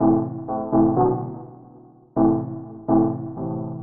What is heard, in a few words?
DEEPHOUSE ORGAN 125 A